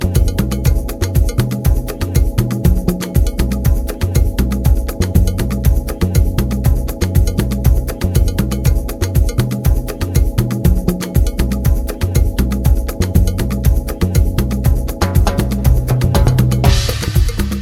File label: DJ Tool W Guitar